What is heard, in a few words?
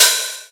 low treble open hat sample g key